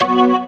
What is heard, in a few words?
SYN Organ; Best Service Gigapack 1